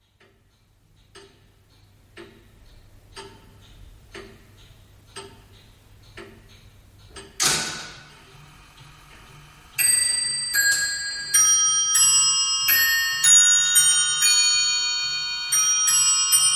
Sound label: blickling hall clocks ticking and chiming